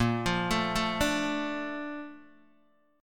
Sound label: Bb Major 7th, Guitar, Open D